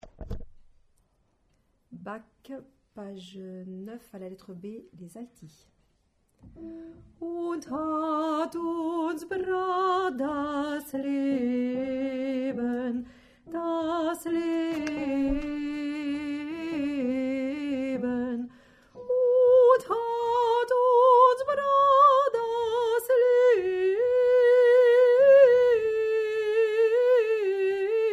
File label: Alto